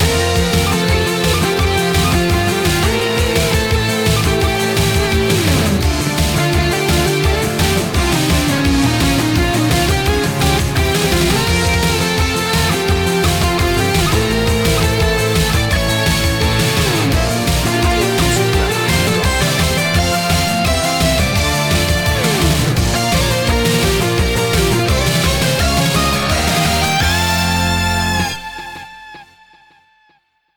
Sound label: j-pop victory